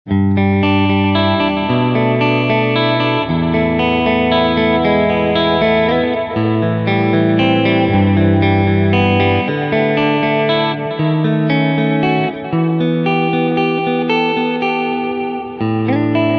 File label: Elliot Tone Master Carvin Legacy IIIs Eventide H9